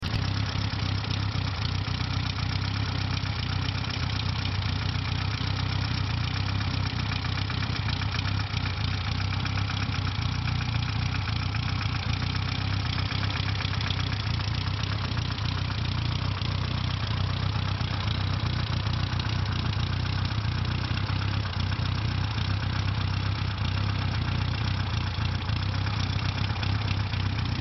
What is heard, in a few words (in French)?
4cv marche moteur